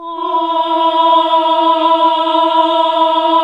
HAL AAH, Nuns Aahhh

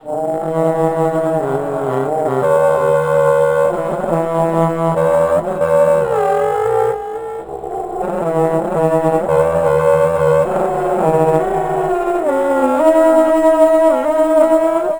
Deep Pipe; Sonic Foundry (Sony Creative Software); Loop Noir

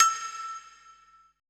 HI BLOCK, PERC; BPC PERC

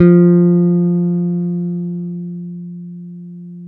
F 4 JAZZ GTR; Univers Sons; Jazzistic

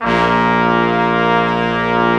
BRS, Quintet long; Roland L-CD702

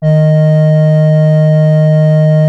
LEAD D#3, Strictly Synthe, Smoov Leadz; E-MU Producer Series Vol. 6 – Analogia Project

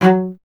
STR VC F#2, Cello; Miroslav Vitous; Solo Instruments VOL-1

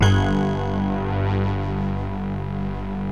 MYSTERY SYNTH

